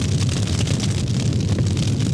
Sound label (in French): gros feu